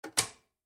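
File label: Server Battery; raw game audio files; MiSide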